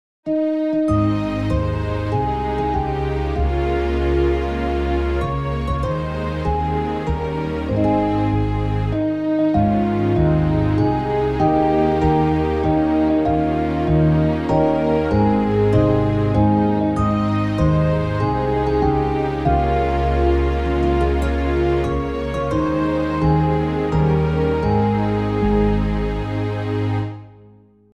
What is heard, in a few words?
happy